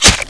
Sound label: katana stab; cstrike